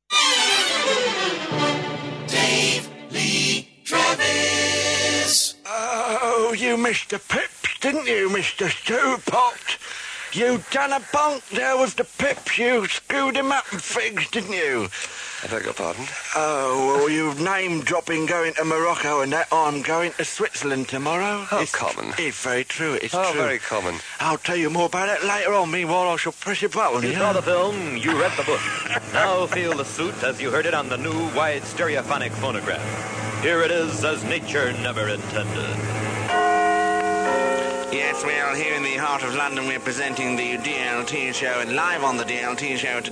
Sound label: pips